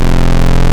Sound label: Modular 3 VCO Sqr, wavetables; Animoog